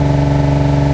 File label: NSX rpm2, Engine; Cars; XBOX